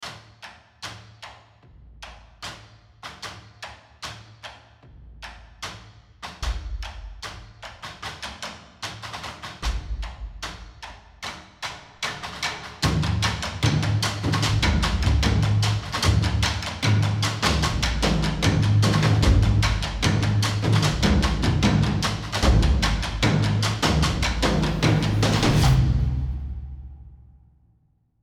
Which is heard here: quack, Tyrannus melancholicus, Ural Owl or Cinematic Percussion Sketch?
Cinematic Percussion Sketch